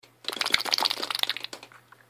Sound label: Super Wet